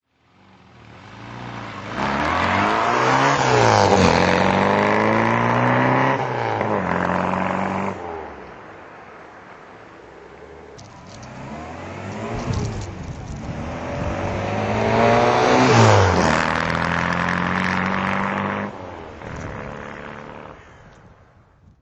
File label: austin healey sprite frogeye drive by